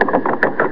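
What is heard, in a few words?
ship creak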